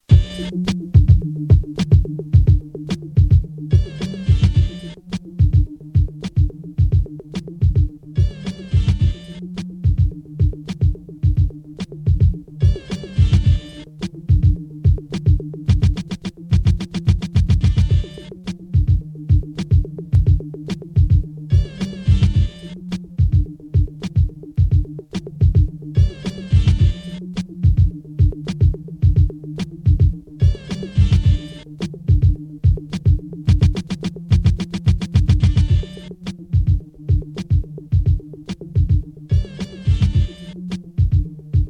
guitar sample beat

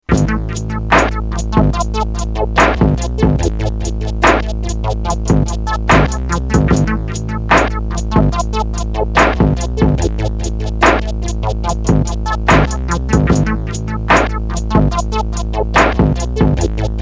sp beat downtempo 72bpm

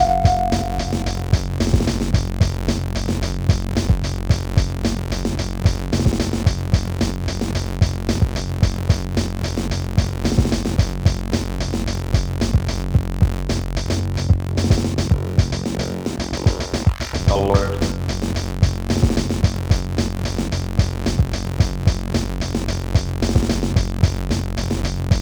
loop, 111bpm

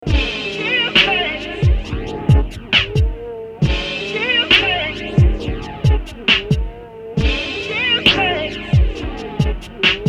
mpc2000 slow beat soul

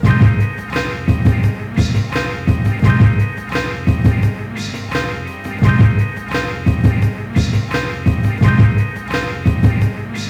give me your beat

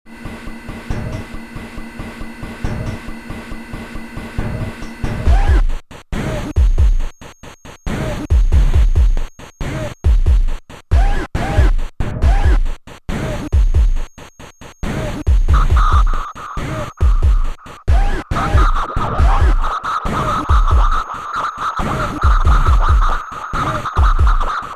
hi pitch noise skratch

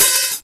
Open Hat, Akai MPC-60

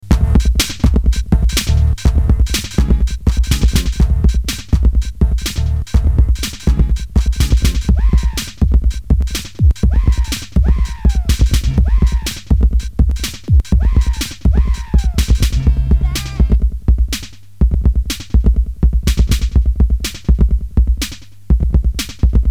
beats; beats for Kate

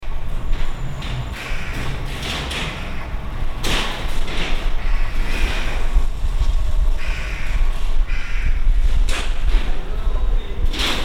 field rec; lotek trax

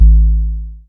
Bassdrum, Emu Modular

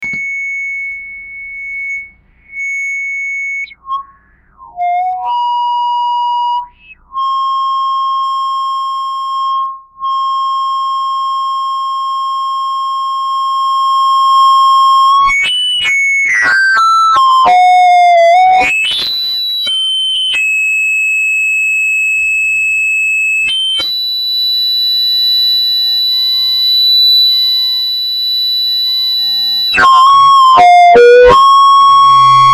noise

